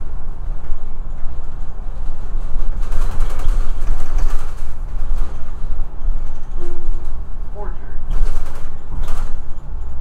Ambience Bus Ride Inside Bumpy Shakey Metal Announcer, Chicago; The Chris Alan; Environmental & Transportation Ambiences; GDC 2020; Game Audio Bundle